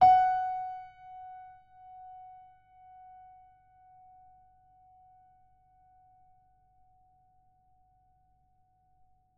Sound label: F sharp 5, piano